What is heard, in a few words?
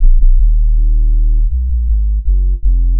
Disrespectful Sub